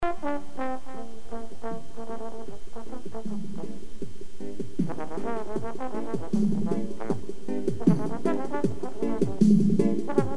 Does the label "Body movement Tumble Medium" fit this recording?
No